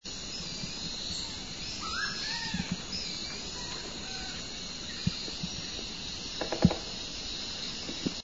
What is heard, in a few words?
scaly breasted woodpecker